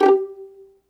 G4 PIZZ, Violin; Q Up Arts; Denny Jaeger Master Violin Library; PERFORMANCE

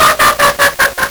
LSDj, Nintendo Gameboy; 300 Drum Machines

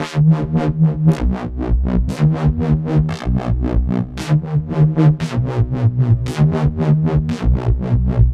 Stone Bass, Drum n Bass; KJ Sawka; Drum n Bass & Beyond

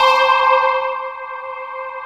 C5, SYNTH; Q Up Arts; Dream Experience

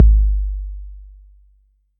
808 bass drum; Goldbaby Tape808 & Tape909; The Tape 808

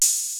808 KIT; ENSONIQ TS-10; Rubber Chicken Software Sample Bank

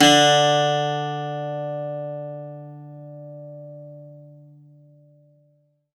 bouzouki; Best Service ProSamples vol.52 - World Instruments 2; AKAI

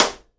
SIDE STICK, Live Snares

